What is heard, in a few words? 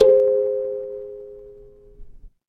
instrument kalimba